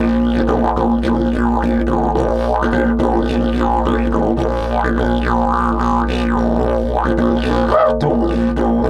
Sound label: 108, DIDGE 108BPM